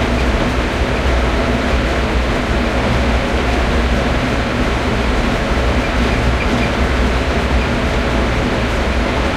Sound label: panzer6 engine int rpm, engines tanks; War Thunder